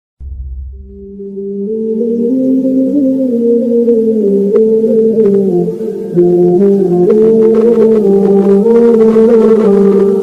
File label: new ringtone broken heart touching ringtone sad emotional ringtone mood off ringtone